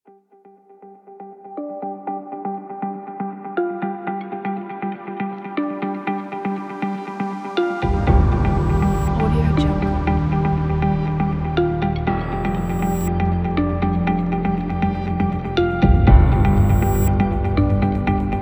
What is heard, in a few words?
car commercial